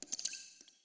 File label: water; zm ded street3